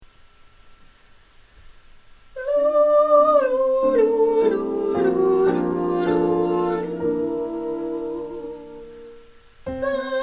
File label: Bass, Singalongs